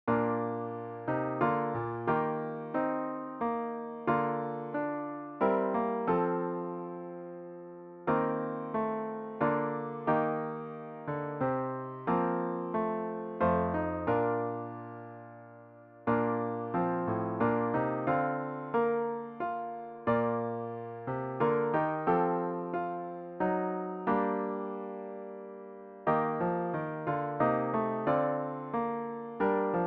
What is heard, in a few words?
Chorale, birthday